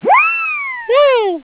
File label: bounce fall; Nokia; 5800 XpressMusic; Bounce Touch